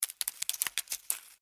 Spider Daddy Long, Animal, Spider, Daddy, Long, Legs, Walk, Dirt, Short, Arachnid, Insect, Bug; BlastWave